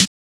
Signature Snare, 808; Snares

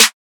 Snare Houston Drill Lo, 808

